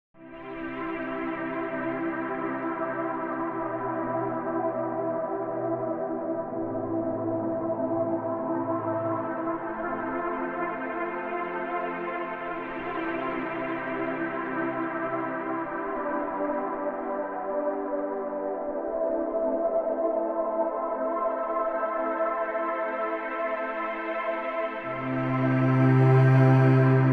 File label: Ambient Dream